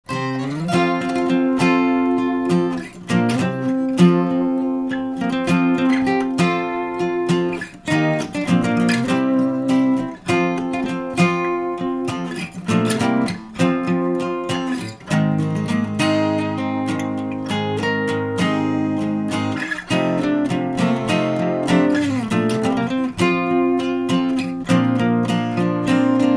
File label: Acoustic Team Theme Song